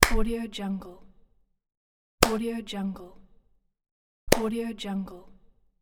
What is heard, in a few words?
clap